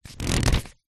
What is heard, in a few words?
household book large thick page turn finger thumb rub